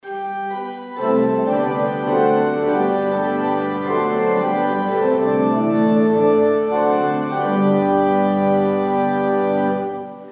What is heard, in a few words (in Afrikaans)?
Gemeentesang